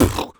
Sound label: Human Beatbox, Beatbox Hits